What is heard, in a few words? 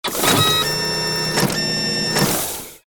servo action style